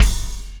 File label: Combo Shard, Processed Drumkits